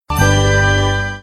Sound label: win